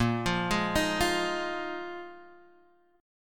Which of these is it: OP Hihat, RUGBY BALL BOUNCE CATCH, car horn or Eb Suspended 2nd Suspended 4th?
Eb Suspended 2nd Suspended 4th